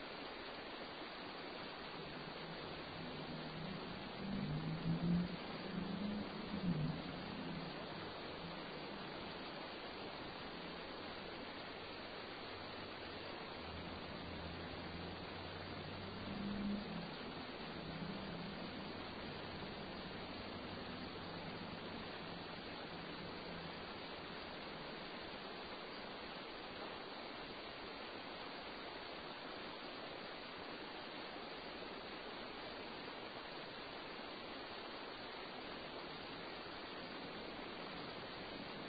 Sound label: music